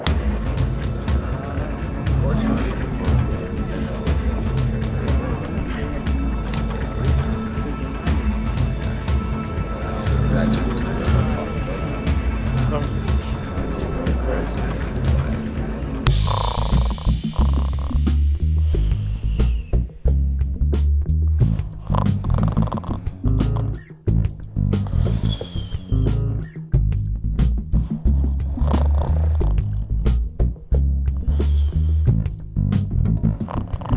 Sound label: level music; daria; finding the daria theme song in an old pc game